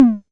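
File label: mattel tom, Mattel Synsonics drum kit, lo fi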